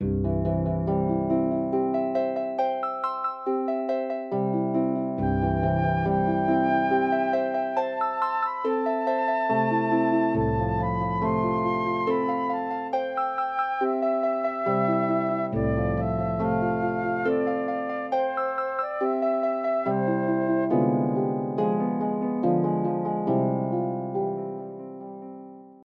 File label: softly awakes my heart, flute and harp; Wedding Music for Flute and Harp Vol 1